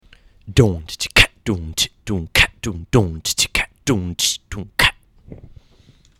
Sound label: beatbox